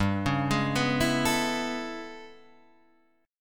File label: A Major 9th G, chord